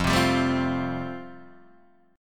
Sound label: F Major Add 9th, Guitar Open D